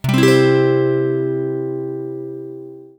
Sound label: C chord